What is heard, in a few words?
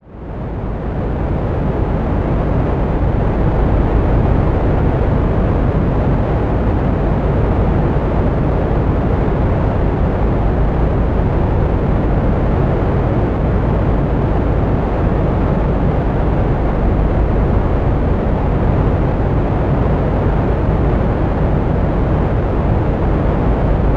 background exterior ambience, BATTLECRUISER - SPACESHIP; Bluezone Corporation